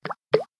Water Drop, notifications; KONKA SP6

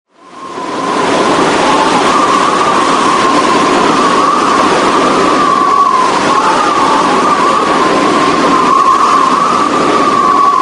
storm, ringtones

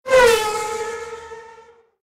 Car instant message, Nokia; Audio themes

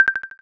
Clear, phonetones

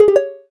gayageum, keytone

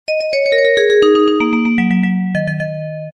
shut audio; China Mobile

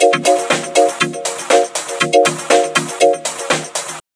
Ether Shake; Pantech Breakout

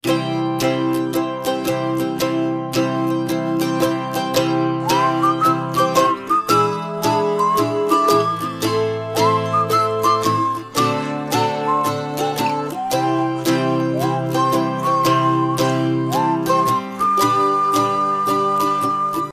Ringtone, ringtones; Winmax; Dolphin-V2

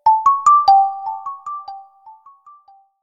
Boil, notifications; Gionee ELife-E7

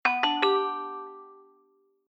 Charger Insert; ZTE ZW20 Verizon Gizmowatch 2

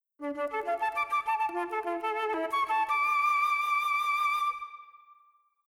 boot audio; gemini; Vertu; Signature-Touch

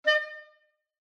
Keywork Calendar, Clarinet

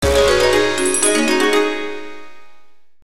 Chinese email alert, Nokia; Audio themes

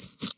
thumb keyboard launch, Nokia N900

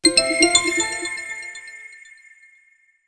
htc boot; HTC Smart

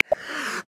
Ocelot, Amazon; Xiaomi Redmi-Note-8-Pro

